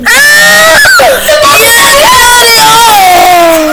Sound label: very hard groan